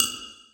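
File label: triangle muted, percussion; Sonatina Symphonic Orchestra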